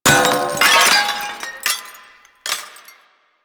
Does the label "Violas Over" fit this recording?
No